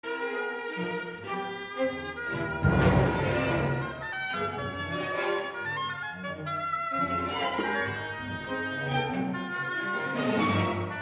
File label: oboe concerto